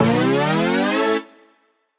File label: up A, Poly Chord Hits Ramp Up; JPEGG; future rave